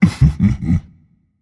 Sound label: hehheh; smite; hunbatz